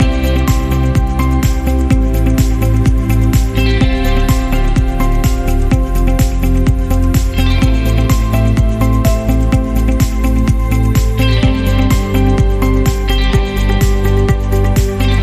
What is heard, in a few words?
Inspiration